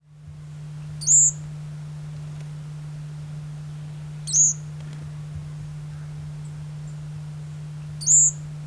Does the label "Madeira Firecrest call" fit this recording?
Yes